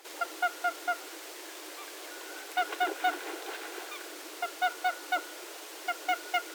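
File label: piskulka golos, lesser white-fronted goose voice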